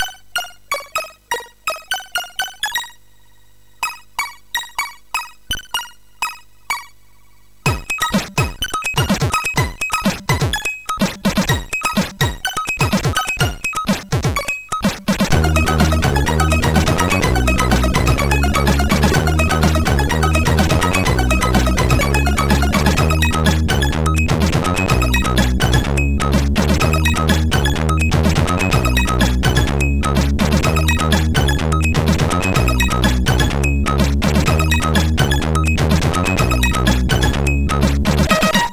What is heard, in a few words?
Remixed, MOS6581R4; HVSC